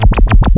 Space wobble

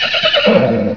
horse; Yipe! III; Monsters; Transcription: Ha ha ha ha ha ha ha ha ha ha ha ha ha ha ha ha ha ha ha ha ha ha ha ha ha

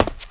ladder step; wob2 demo

